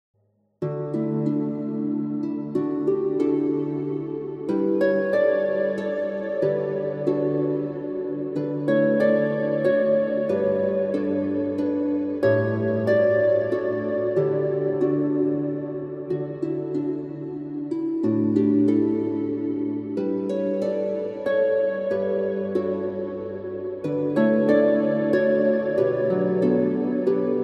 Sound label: christmas music heavenly harp christmas instrumentals relaxing fireplace; CANTARI